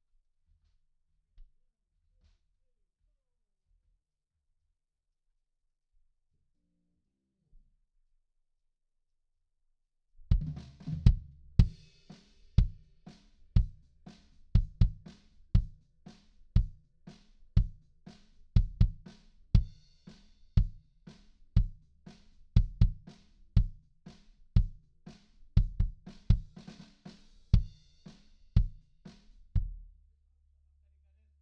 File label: BD IN